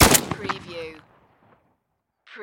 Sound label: Shotgun Benelli M4; sound effects bible